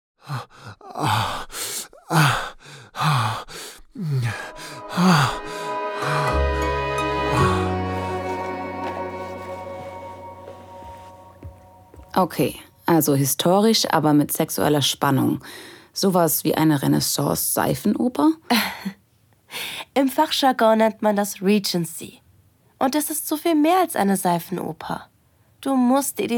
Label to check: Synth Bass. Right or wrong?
Wrong